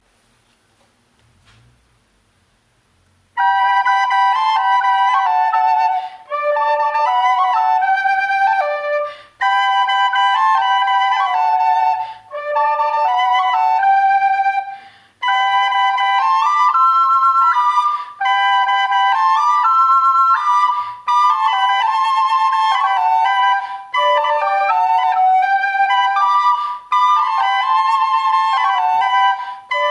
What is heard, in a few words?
the same hymn on recorder instead of vocals